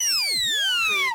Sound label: CARTOON BOMB DROP